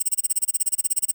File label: sparks win count up Loop; netent